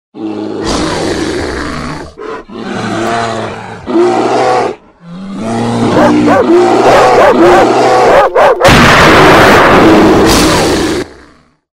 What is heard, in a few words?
bear